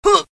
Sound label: hit; Adventure Time - Break The Worm